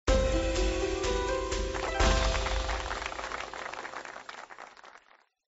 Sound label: win